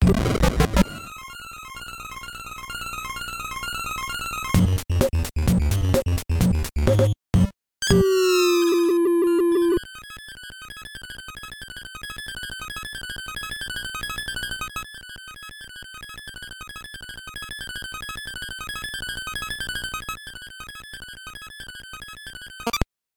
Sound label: Game Over Theme; DM NES